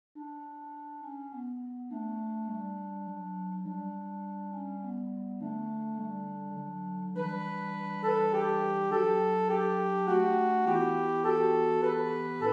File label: Adsum Domine soprano